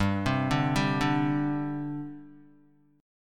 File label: G Suspended 4th, Guitar; Gsus4 arpeggiated